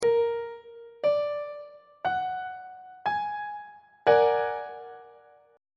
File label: a sharp augmented 7th chord on treble clef